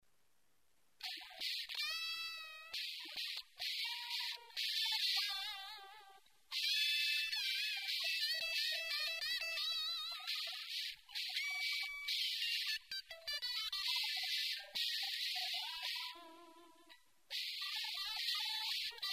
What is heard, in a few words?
overdrive; Felipe Dalpiaz